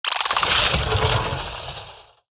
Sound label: Prosthetic Arm Attack